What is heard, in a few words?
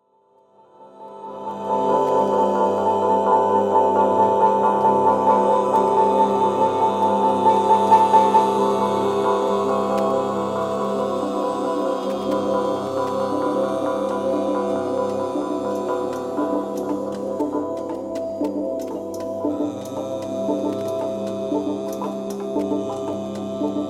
Gongs